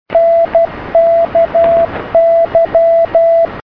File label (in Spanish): onda corta cw